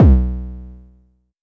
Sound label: Low Kicks B1; LIMAT Div Dept; Drums